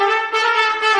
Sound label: trumpet danger; music